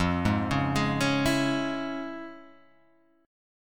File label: F Minor Major 7th Sharp 5th, chord